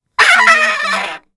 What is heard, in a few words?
screeching faucet short